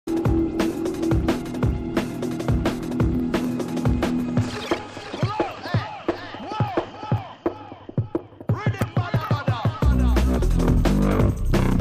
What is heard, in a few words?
Drum and Bass Jungle and Old Skool; Mantra Live; UK Bass Radio